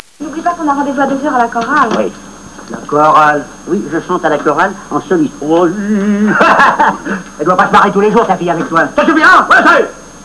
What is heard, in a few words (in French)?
chorale; Départ pour la chorale; Le gendarme de Saint-Tropez